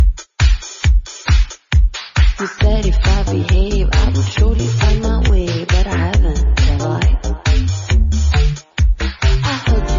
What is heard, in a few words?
wrong note